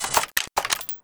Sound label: sniper turret cycle